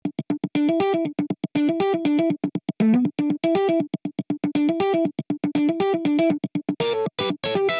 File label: amp wet guitar funk; Morphdrive; Demos for hybrid configurations